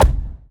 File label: ball collision geo low